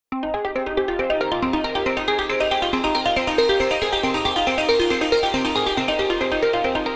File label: Arp Trance Spire